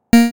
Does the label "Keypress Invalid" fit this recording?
Yes